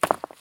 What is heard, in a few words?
Pyroball; pokerogue; battle anims